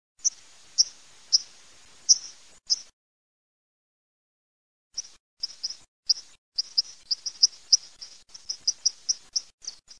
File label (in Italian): MERLO ACQUAIOLO, birdsound